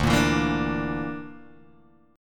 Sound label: D Suspended 2nd Sharp 5th D, Guitar, Open G